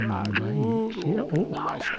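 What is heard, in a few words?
Music Village Calm Inside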